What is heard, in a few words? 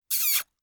kiss